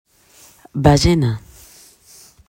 ballena

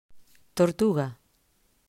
tortuga

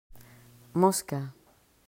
mosca

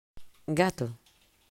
gato

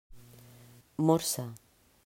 morsa

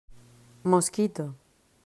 mosquito

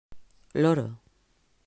loro

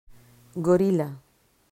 gorila

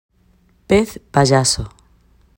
pez payaso

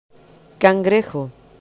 cangrejo

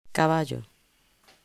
caballo

